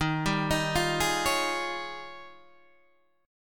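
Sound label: E flat 11th E flat, down, Guitar, DADGAD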